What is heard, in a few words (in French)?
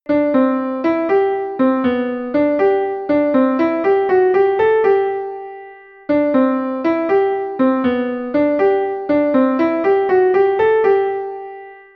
couplet ralenti piano